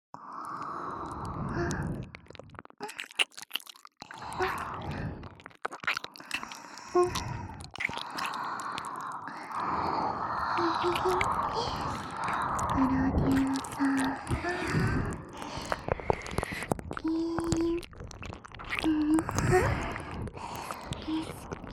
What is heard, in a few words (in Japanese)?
しゅきしゅき両耳舐め 3WAY ループ; SE有